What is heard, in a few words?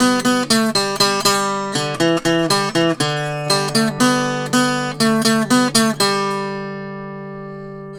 Tenor Guitar, Appalachian